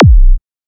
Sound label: Kick